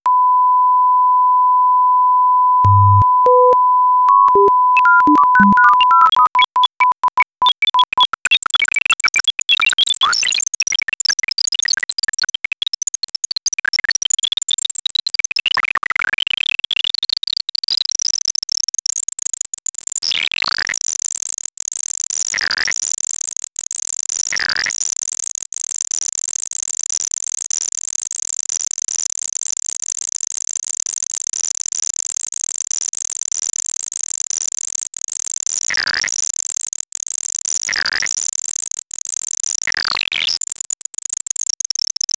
sinus

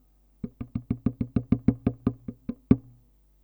kopogás mély gyors, guitar

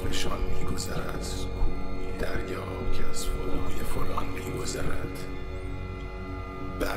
Vokalis szolo

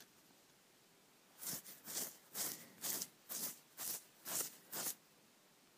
emberi hang (vakaras)

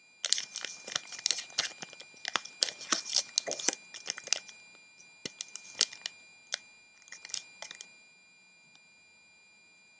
zajosság; 5 hang zajossag

